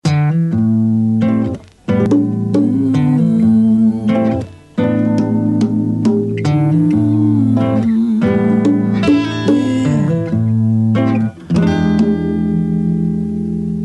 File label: Blues